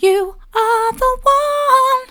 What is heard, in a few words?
soft, vocal